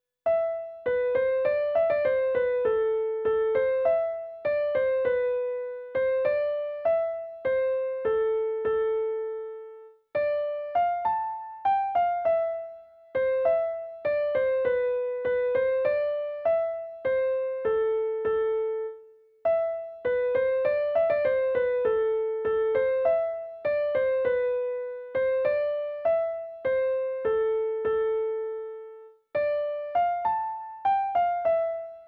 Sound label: piano